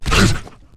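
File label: ghost damage, monster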